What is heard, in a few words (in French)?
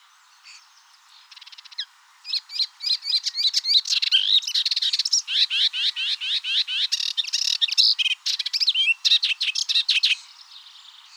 hypolaïs polyglotte Hippolais polyglotta; balade a la decouverte du vivant